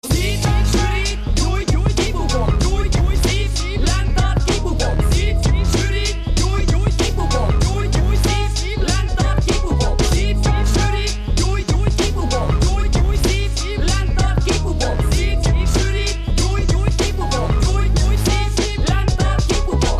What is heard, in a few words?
sub bass monster